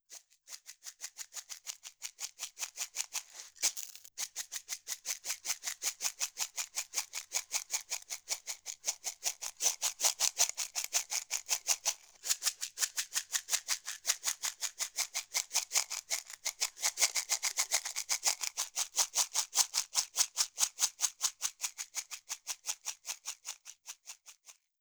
conchas

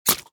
CUCHILLO

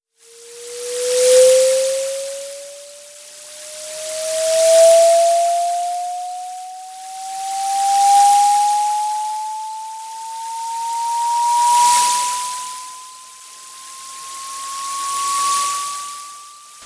sintesis de verdad

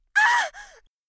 GRITO

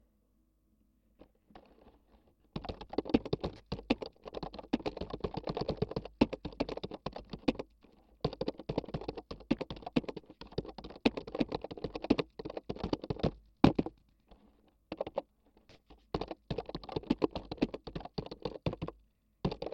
teclado

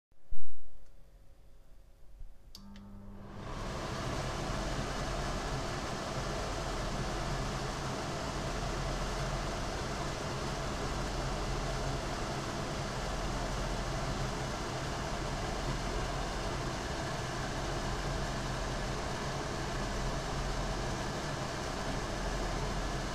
campana ext

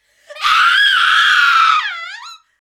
GRITO

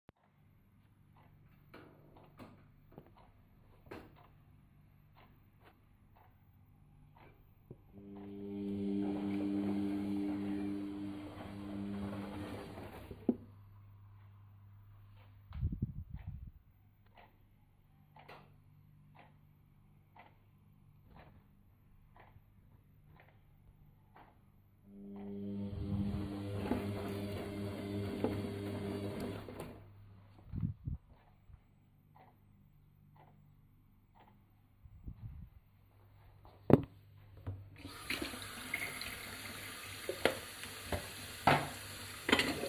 cocina

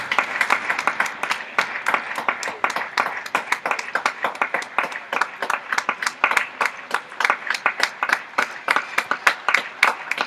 casa aplausos

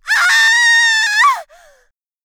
GRITO

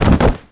CAIDA CUERPO

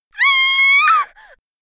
GRITO

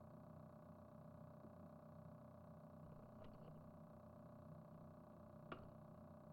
ventilador grande

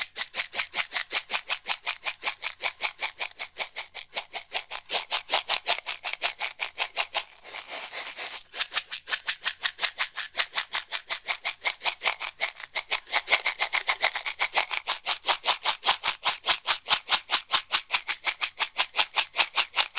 conchas voltear

